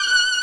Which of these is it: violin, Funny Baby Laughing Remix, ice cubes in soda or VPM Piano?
violin